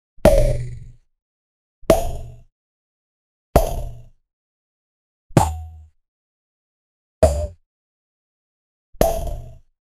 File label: tongue attack rapid whips; silly